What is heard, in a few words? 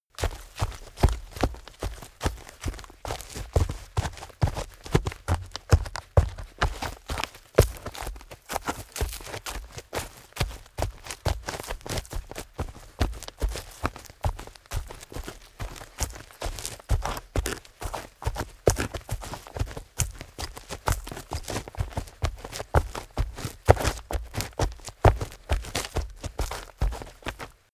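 step forest run, footsteps; schritte; menschen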